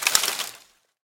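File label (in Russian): громкий хруст листвы под ногами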